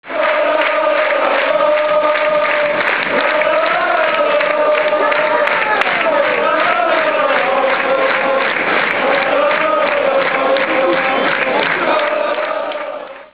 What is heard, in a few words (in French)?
La la la lalala